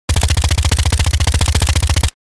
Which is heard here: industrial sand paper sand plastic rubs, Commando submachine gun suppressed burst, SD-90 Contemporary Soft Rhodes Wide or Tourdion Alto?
Commando submachine gun suppressed burst